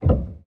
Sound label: piano release